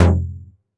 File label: stab grunge, stabs